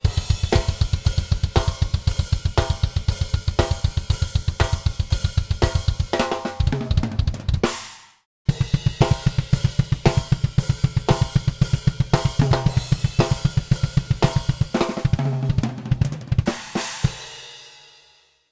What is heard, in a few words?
img stageline drumset double bass; vergleich mit akg d112 mkii gesamtes set